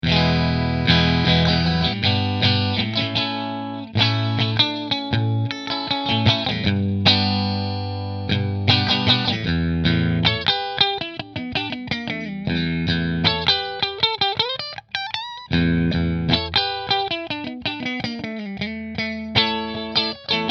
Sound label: Music Man JP Middle Fender; 2002 Music Man JP-6 Mystic Dream